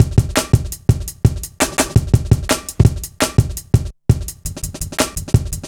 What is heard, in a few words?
Live Wire, Drum Loops, 168.5, Jungle; Zero-G Jungle Warfare 1, 2 & 3